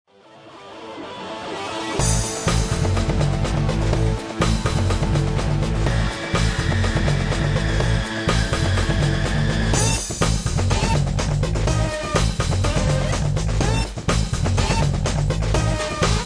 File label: music home loop; Sports Games; BaseballClassic